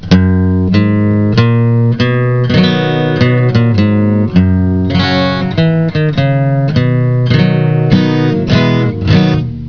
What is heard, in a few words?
KUNTRY